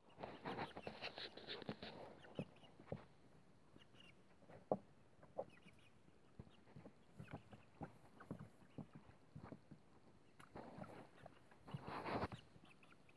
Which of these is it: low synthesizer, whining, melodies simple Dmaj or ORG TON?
whining